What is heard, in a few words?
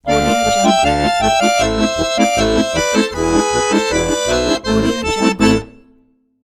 russian accordion intro logo